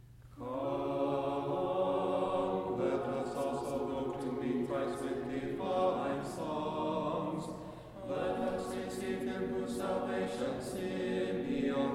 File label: Kievan Sticheron Example Bass; Tone1